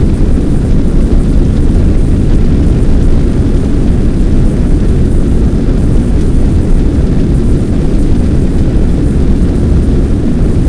fly loop, vehicles; Starship Troopers

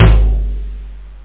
dry tom; toms

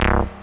mirage synth bass; OctaMED SoundStudio V1

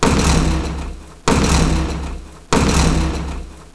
dong; Microsoft Multimedia Viewer How-To CD

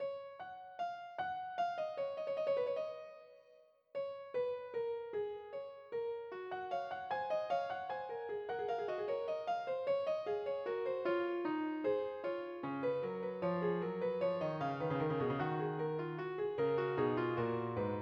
F #13 in F# Major, midifile; Bach Book 1

